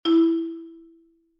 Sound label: tone